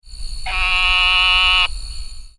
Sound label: Hypopachus variolosus; voice